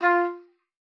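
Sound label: F4, oboe d'amore; Xsample VOL-7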